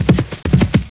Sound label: hoofbeats loop, Giant Boar